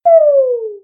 bad roll; Dice World

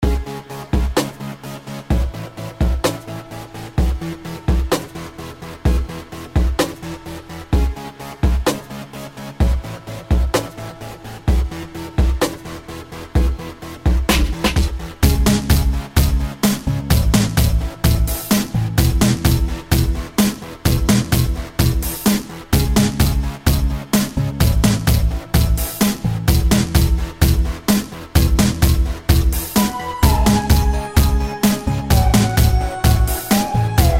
game over music; AudioRallyRacing

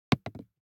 DICE on Plastic, Throw and Roll, Foam, 1 One Die; Samples; ArticulatedSounds; DICE Sfx Party (Extended)

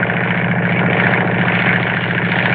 Plane Prop Loop; SFX Kit; Airplanes

